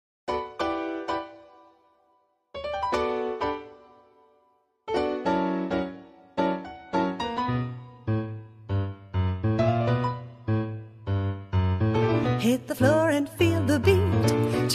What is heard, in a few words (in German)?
jazztime